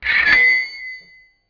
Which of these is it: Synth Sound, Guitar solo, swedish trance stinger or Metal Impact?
Metal Impact